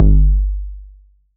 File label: 808 bass drum Tape Sat; Goldbaby Tape808 & Tape909; The Tape 808